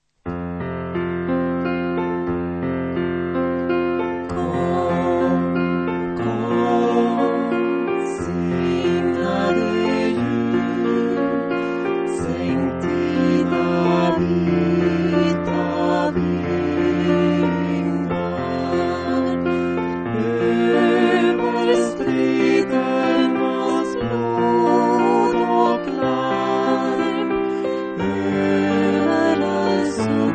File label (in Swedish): Jul Jul alt